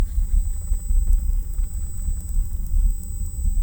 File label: Hydrophone